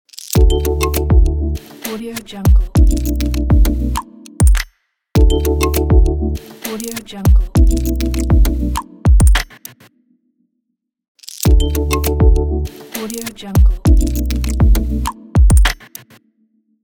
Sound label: podcast logo